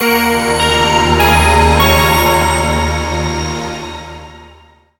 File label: lose; Phantasy Star Online Blue Burst